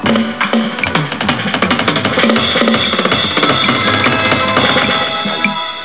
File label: drum; Lard`s Drumming; Mark Radcliffe and Lard